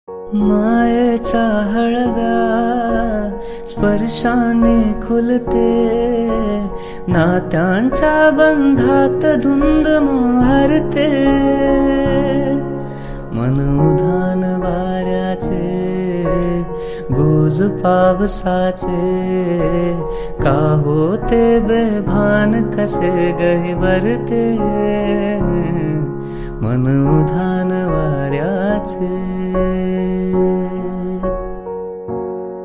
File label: marathi song